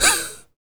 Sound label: FEM PERC, Vocal Jazz FX